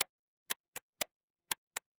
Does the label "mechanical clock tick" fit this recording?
Yes